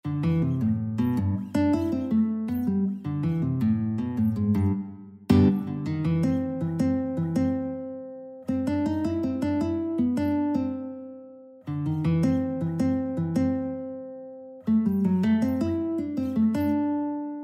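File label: electric guitar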